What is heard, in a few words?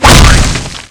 candy attack; CSO LightZombie